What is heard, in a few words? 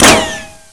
metal, debris